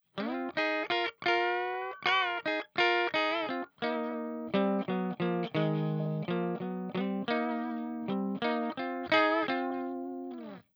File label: pitch fourth